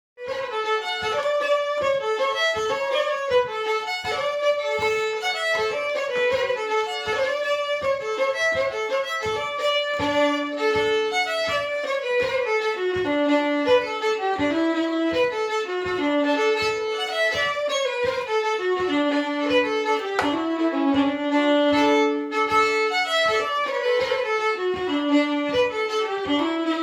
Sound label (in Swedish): Schottis; efter Oskar Andersson